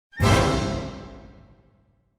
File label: glissando hits